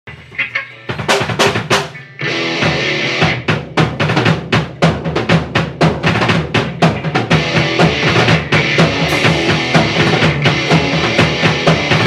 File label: Thump, jam